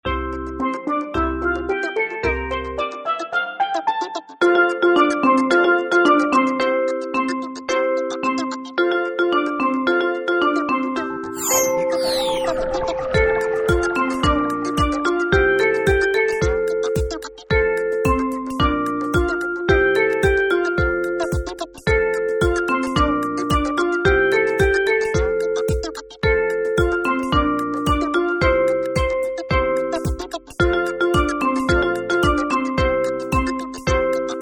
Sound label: karaoke